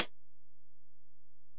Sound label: HiHat, Alesis DM-PRO